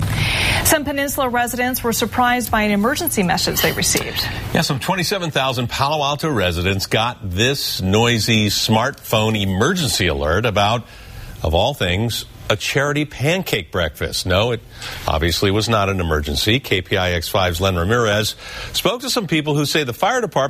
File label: Palo Alto emergency alert